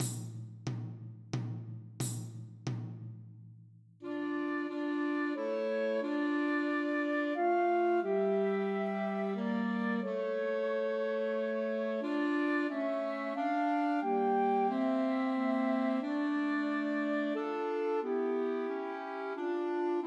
Coventry Carol Christmas Trios for Flute Clarinet and Alto Saxophone